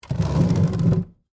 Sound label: Wood Chair Slide, Drag; Foley; Sound Effects:Materials; BlastWave